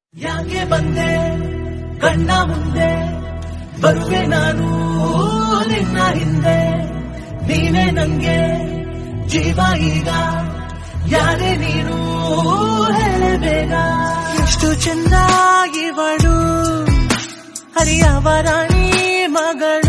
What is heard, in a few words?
kannada new songs dj remix